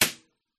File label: Impulse Response The Cabin Main Room; uberduo